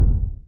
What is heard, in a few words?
guess hit